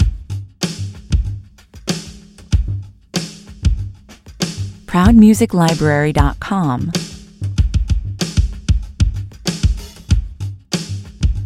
hypnotic state dance rhythm level loop drums

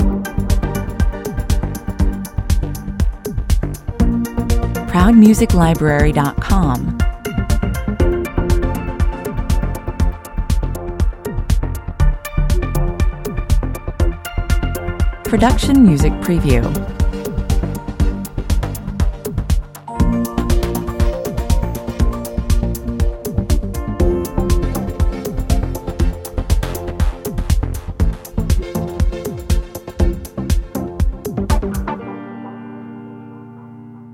technological systems (on hold) 120bpm

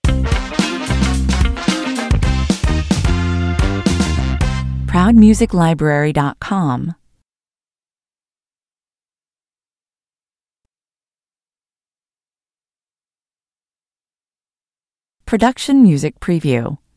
funky stinger

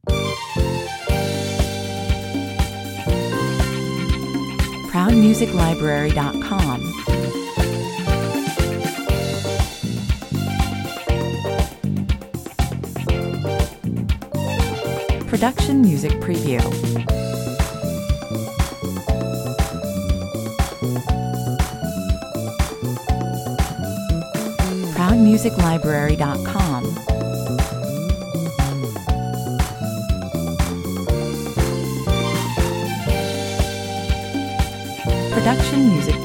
disco 120 bpm

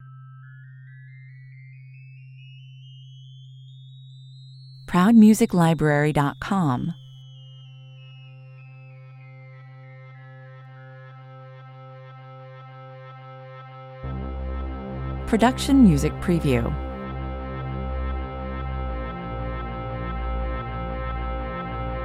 dirty electro 91 bpm

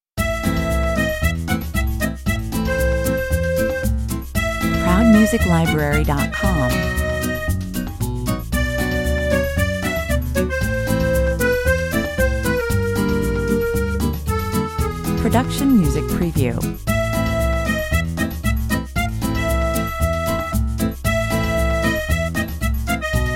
happy nut 115 bpm

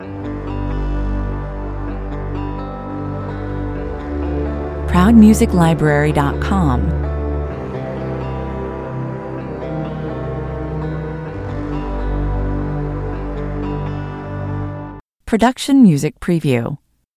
loop strings and guitar

